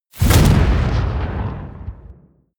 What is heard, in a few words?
detonation